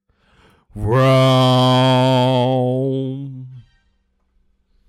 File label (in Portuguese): buzina